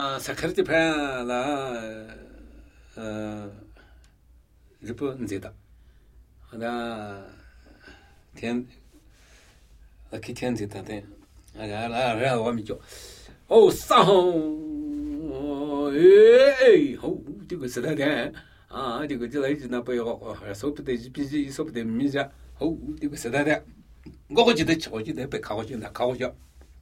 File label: Namuyi Tibetan Ritual Chanting; Pe ka phse su